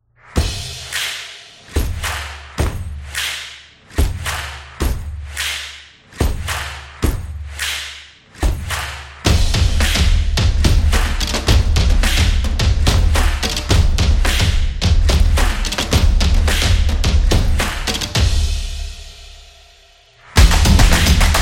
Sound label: The Clap Beat